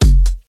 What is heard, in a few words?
fat big techno kick and hat one shot; kick drum samples